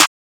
Clap Layered; Drum One Shots

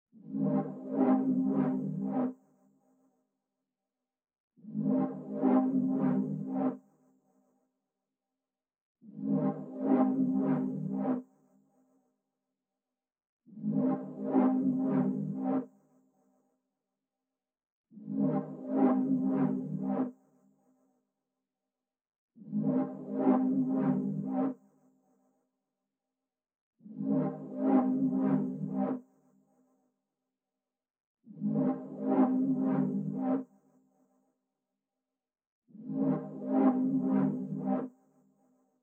108 BPM A Min Reverse Synth; Melody; Loop Stems; Cymatics; 2022 Melody Collection; Wavy; Space Invader

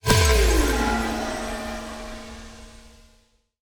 Hero Death FX - AMin9, Video Game; Cymatics; Video Game Sample Pack Vol 1

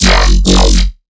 Riddim Bass Hit F#; Slippery

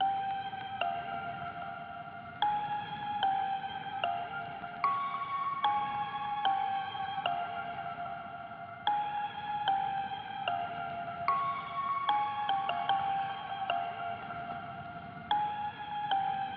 149 BPM F Min Bell, loop stems; Cymatics; 2022 Melody Collection Platinum Expansion - Pt 2; Pearl

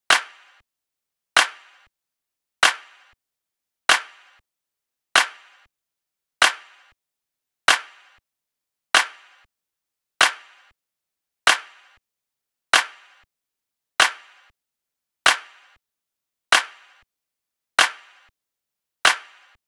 Space Drum Loop - 95 BPM Clap; Loop Stems; Cymatics

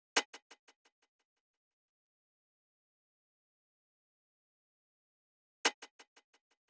drum loop 175 BPM percussion; Loop Stems; Cymatics; DOPE DRUMS VOL. 1; Jumpshot

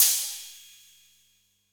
Cymbal; Modern Drum Kit; Gravol

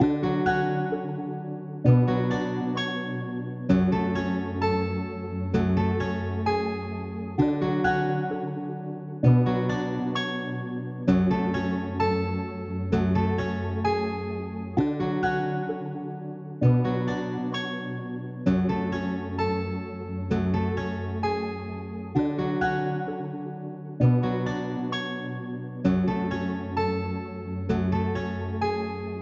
Sad Melody Loop - 130 BPM F# Min Guitar; Cymatics; Oracle Sample Pack

